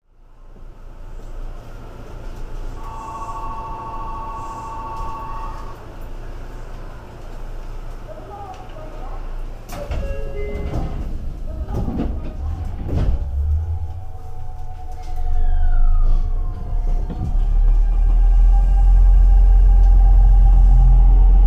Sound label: train